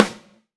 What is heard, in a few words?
Dnb snare